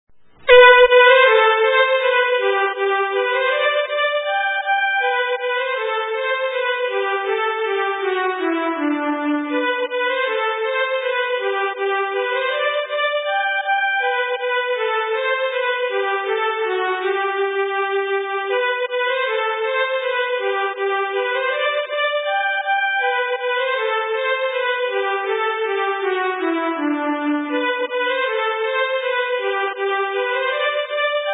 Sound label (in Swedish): Kroarns Polska